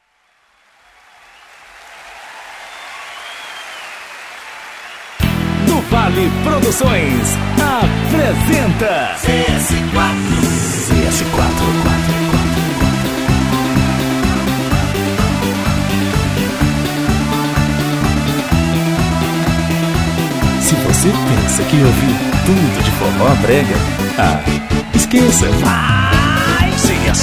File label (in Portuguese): abertura medley